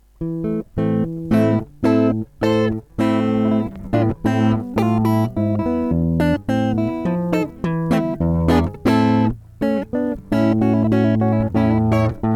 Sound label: guitar; carcassi